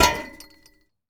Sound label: chain link fence deploy; Rust